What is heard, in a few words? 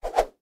CARD FLIP; Zodiac Millionaire